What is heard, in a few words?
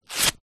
human wax strip rip peel skin remove hair